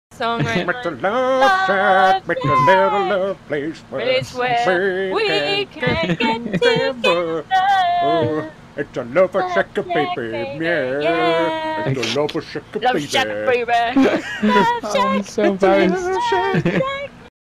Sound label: Singing